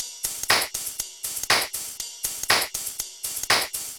BEAT, DANCE DRUMS; MAGIX

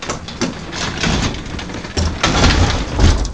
SLIDE

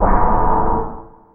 TUNNEL; BEAT2000

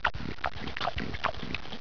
EAT

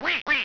DUCK

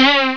SPRING

